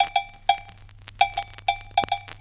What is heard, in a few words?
bottles and cans, Drum loops